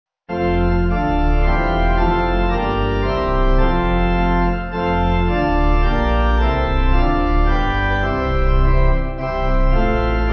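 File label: Pipe organ, church music backing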